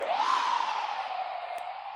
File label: cold chill emote; animal crossing